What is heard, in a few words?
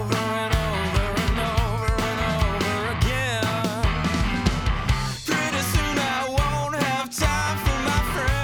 master with